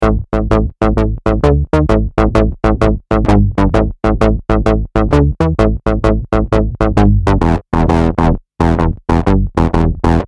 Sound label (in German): 4 Voices + kurzes Filter; EHX MS